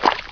pl slosh; mistake coop; player

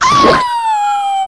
wolfos die, enemies; zelda

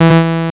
error; svencoop; zelda

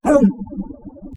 pit drone die; svencoop; legends

